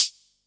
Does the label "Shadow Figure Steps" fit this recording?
No